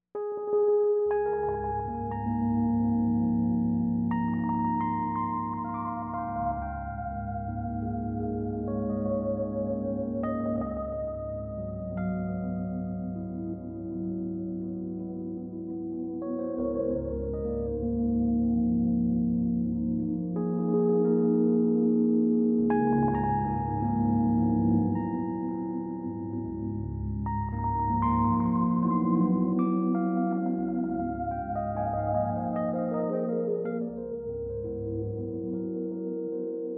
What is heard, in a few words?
lunar piano recital